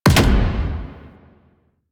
Sniper Alt Fire Punch; DE; Warframe; Frumentarius; Exalted Sniper

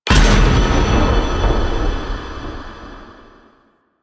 Void Dragon Weakpoint Destroy; Enemies; Warframe; Duviri

